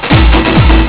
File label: tekkno eq reverb